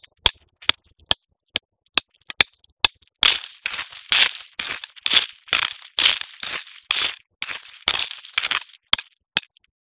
gravel

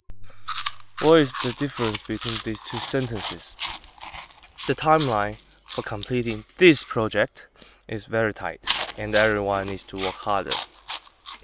Munching

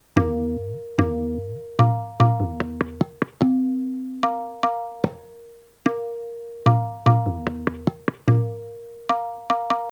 Ektal, Tabla; Tabla-Transcriber

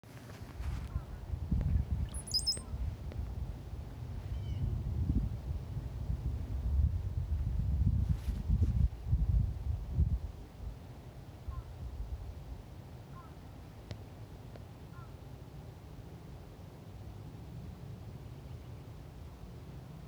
Fish Crow, Corvus ossifragus; Aves

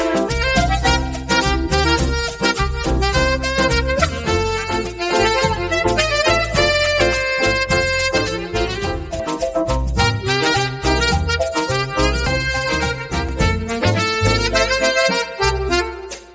accordion sax; Riffusion-Melodiff-v1

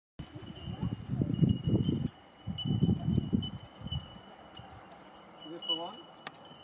Common Grackle, Quiscalus quiscula; Aves

